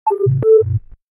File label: Alert; Samsung SGH-T839-Sidekick-4G